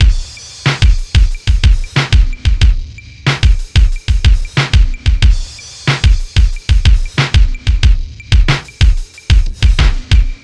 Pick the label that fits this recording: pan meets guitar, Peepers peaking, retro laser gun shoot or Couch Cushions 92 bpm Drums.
Couch Cushions 92 bpm Drums